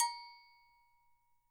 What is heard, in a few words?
AGO LO, Percussion; Sampleheads; NYC Percussionworks; ST PERC VOL2